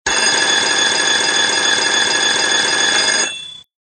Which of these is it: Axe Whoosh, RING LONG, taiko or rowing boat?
RING LONG